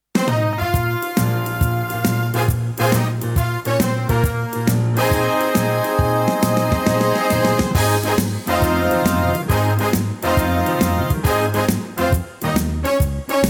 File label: Bb swing